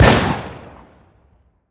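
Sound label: weapon mike203 fire player atmos int, launcher; gmod; viper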